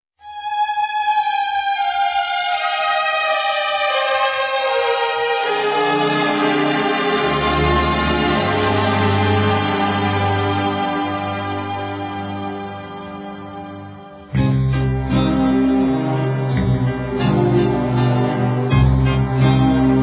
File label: Waltz, 28 bpm